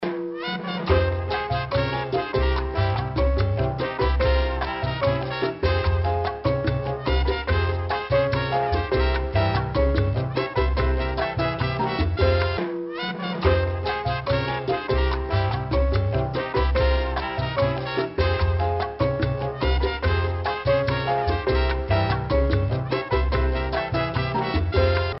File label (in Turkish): santral fon muzigi